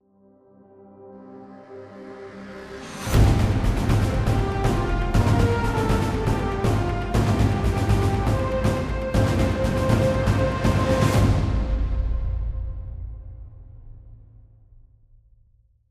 Character Select, Solo Mode; apex legends season 12; Bangalore

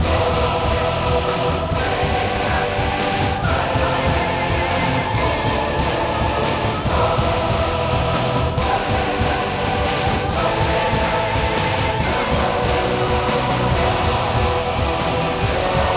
Battle Loop

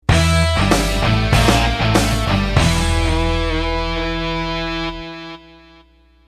Defeat; battletanx global assault; ps1

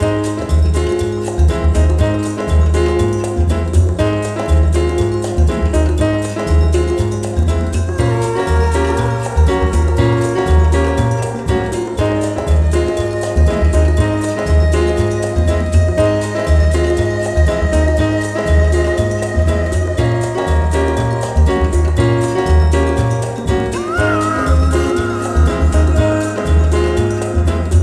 Brazilian Music; Rayman Raving Rabbids; PlayStation 2

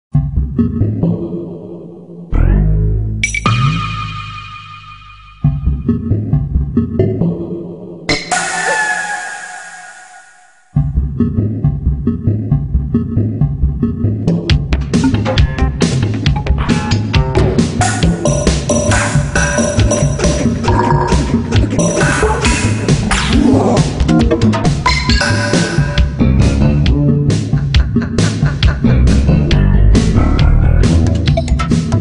Cave; carmen sandiego math detective

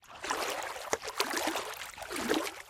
water; friday the 13th killer puzzle

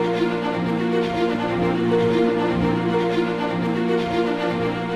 Healing Loop; Ruined Citadel; prince of persia 2008 gamerip

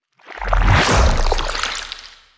Big Monster Spawn Gore; Pulling No Punches

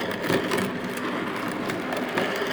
thick plastic slide hard; a dump in the dark

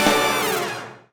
Combo Hit

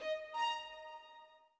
orbit violin; Sky Children of the Light gamerip

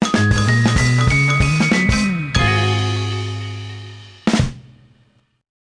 Generic Sting Success; bully scholarship edition

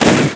Bang2

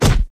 Hit Body

